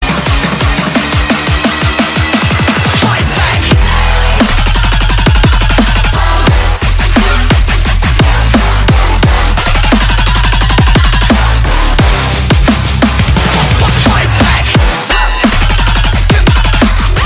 Hard DubStep

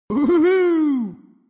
notification

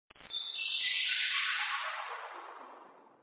Space Descent

